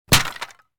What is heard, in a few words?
Cartoon door breaking wooden